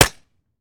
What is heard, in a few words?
fire last mech; gmod; viper